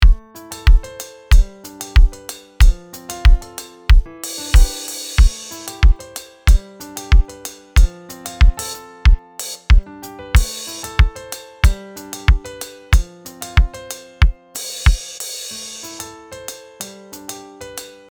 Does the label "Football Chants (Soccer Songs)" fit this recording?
No